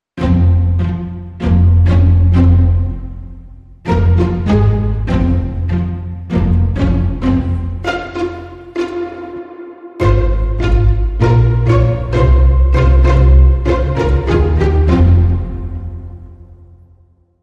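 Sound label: Hybrid Sting, Orchestra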